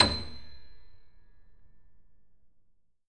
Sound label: Grand Piano B6 f